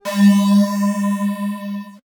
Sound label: Beep Long; Multimedia Internet CD-Rom Flash